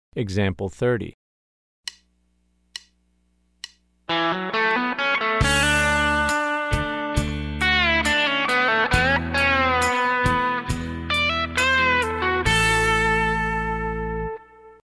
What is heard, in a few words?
Country Rock